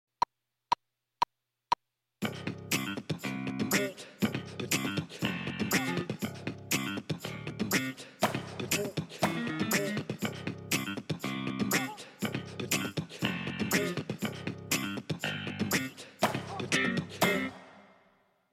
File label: Piano